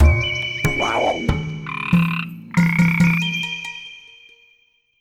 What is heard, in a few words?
basic Mood Bosque